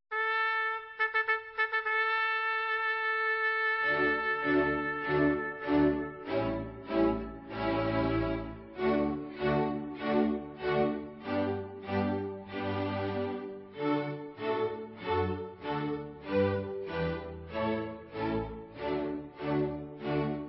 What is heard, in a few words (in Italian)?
Tromba e cavalli